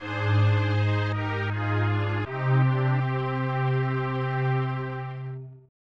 DEATH; lionking

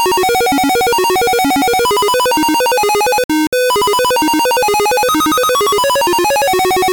frog; frogger

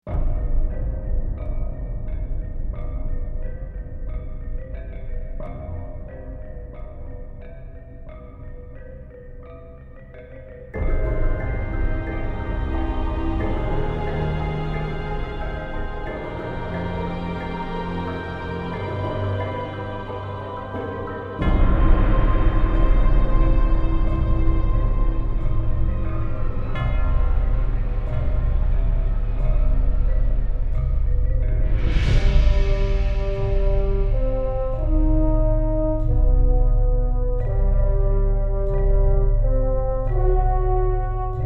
Outdoor Area; wiz8

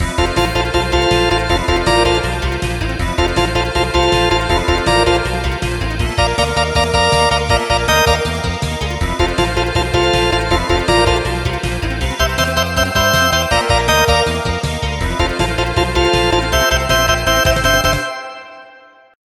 Mayhem Level Jingle

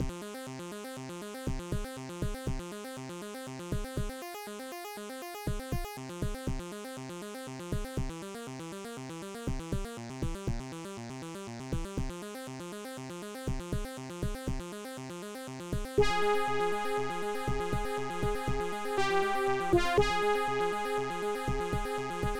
Game Over; dragon

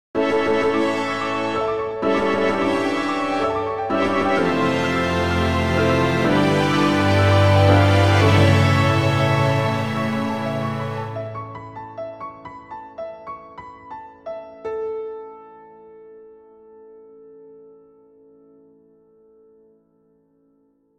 Victory

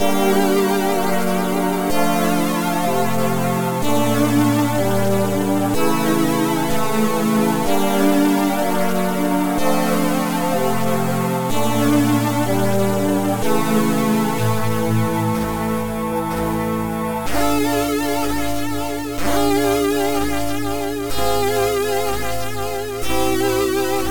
Game Over; ambermoon

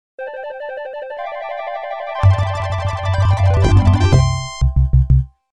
Opening Fanfare

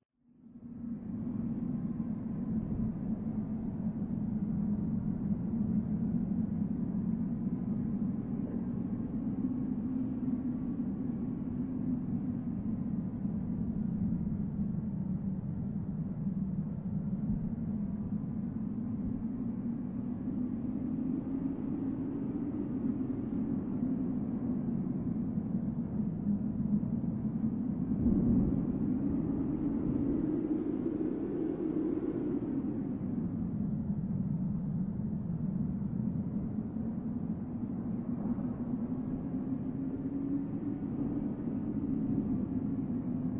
Wind; iofdragon

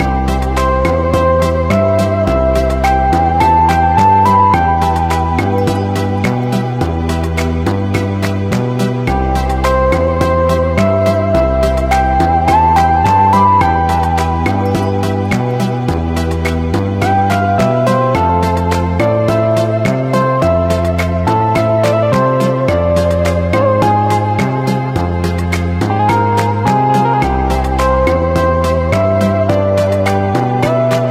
muzak; ionfury

